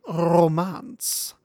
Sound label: growl